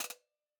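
HI HAT TRILL SHORT TRILL SHORT HI HAT, analog recorded drum; Sonimus; s series drum pack; STONEQ 4K; SATSON; SATSON CS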